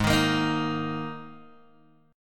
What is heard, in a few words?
G sharp Suspended 2nd, G sharp, down, Guitar, Open G